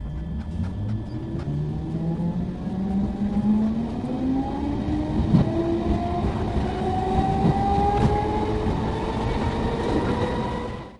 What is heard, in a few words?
train leave; cube-tube